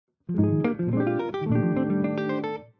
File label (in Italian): Arpeggi Irregolari